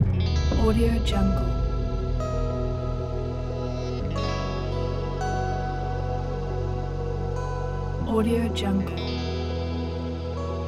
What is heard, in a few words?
guitar ambient logo